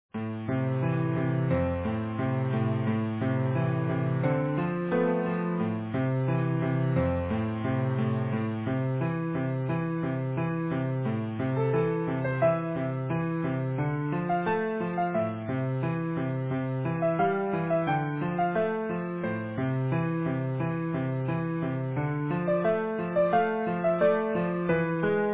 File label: Easy Piano